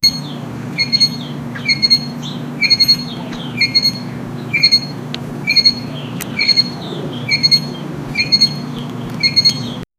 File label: alarm clock ding